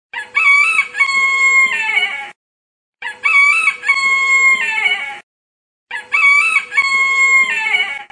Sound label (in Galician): canto galo galiza